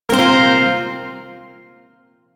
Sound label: Shawzin Pent Min Chord K; DE; Warframe; Duviri Shawzin